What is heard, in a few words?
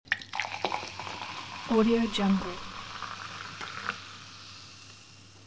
sparkling water